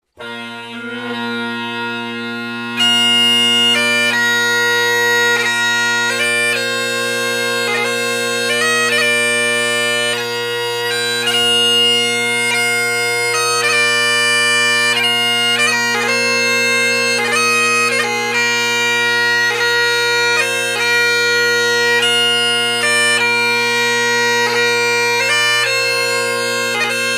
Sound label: slow march